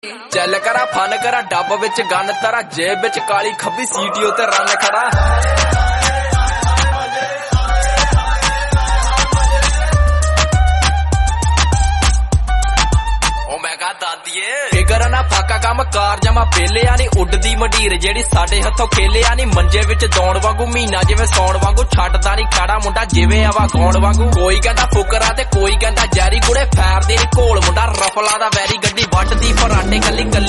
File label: Punjabi Song Ringtone